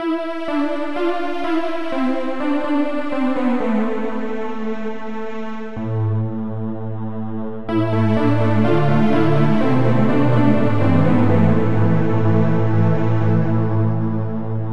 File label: brightness